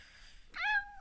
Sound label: Meow